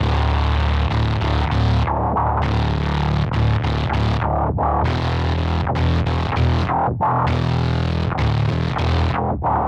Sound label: Synth Bass; Bass X